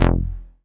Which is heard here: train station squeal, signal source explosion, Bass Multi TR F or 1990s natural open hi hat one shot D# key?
Bass Multi TR F